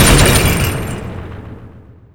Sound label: turret mgun end